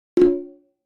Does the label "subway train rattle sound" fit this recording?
No